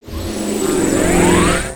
howl; creatures organic

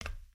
Foot Steel Boot Walk, footsteps

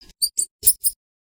3D Storage Room rat; ambience; ninjago

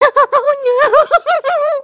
fear

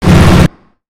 Robot Air Flail; creatures mechanical; Lego

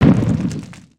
Troll Rock Crunch

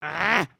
Angry, emotes

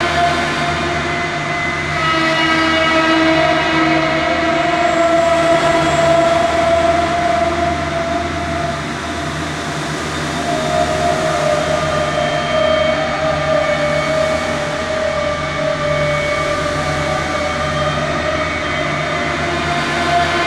Cave Distant Factory Ambi; ambience; avant gardens

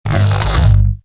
Jail Cage Creak; gnarled forest

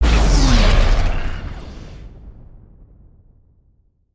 Tornado Endblast

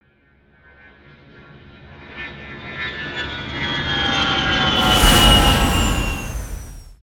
rocket inbound

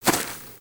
Footstep; creatures organic; Lego; Strombie

